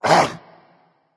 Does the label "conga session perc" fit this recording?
No